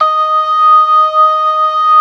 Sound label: ORGAN, LOWER MANUAL